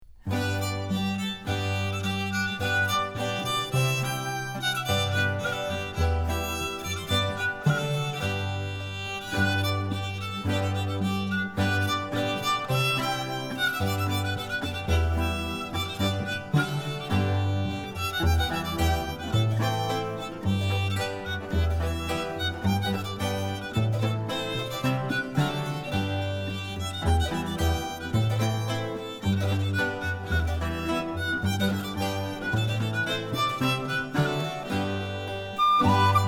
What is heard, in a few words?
rebec; newcastle